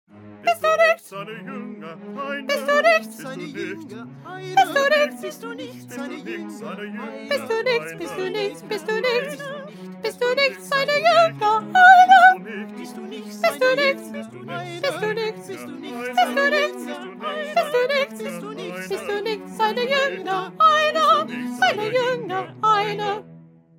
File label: Soprano; Bach; St John Passion